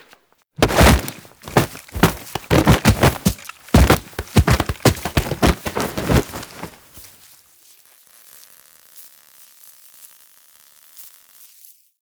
Foley Rolling; Quests; The New War; Grineer Eidolon Shotgun; Kahl

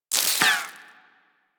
Bullet Impact Debris Dirt; Warframe; Weapons

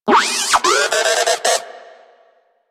Moa Compassionate Vocal Stomp, Pets; Warframe

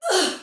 Pain Soldier Female, Battle Barks; Dialog; Sinecure

